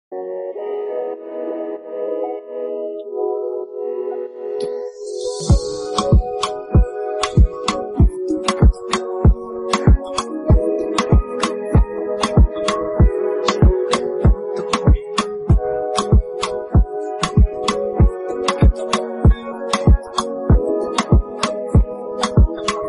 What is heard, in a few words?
instrumental